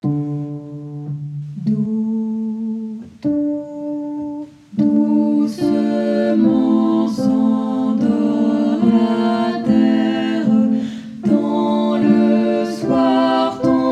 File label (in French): berceuse cosaque tutti